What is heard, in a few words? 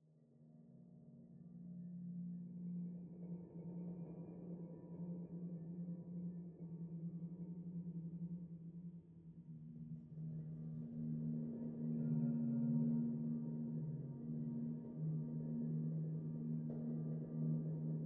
Strings and Broken Harp; The Last of Us